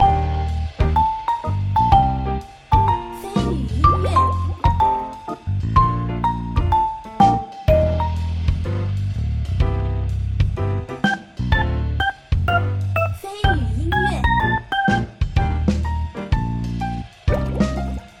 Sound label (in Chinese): 轻松-小清新-唯美-律动